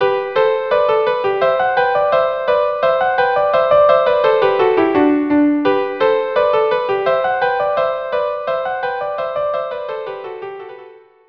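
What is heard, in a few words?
Gavotte Allemande viola duo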